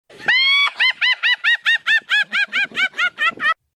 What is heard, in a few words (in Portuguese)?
risada bruxa alegre